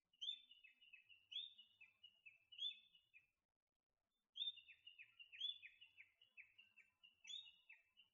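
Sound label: sendai mushikui call, Eastern crowned warbler; jinaki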